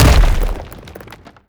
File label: bash hard, brickwall, destruction